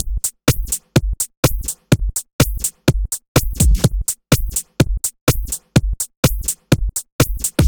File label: Backed Beat B; Disco House